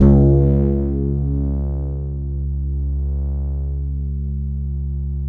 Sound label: MIDIFRET C#2, Bass, MIDIFRETLESS; Masterbits CLIMAX 7